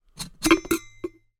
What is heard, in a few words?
household kettle metal lid remove; zapsplat